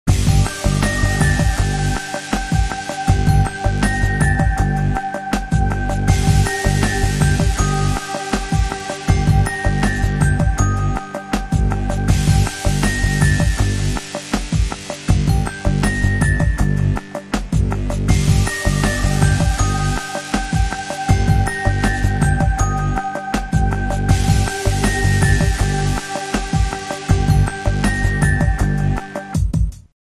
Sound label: Bells Cry